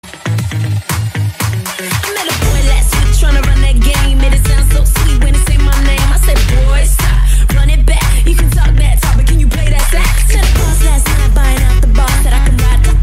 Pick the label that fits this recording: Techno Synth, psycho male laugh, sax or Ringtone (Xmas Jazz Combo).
sax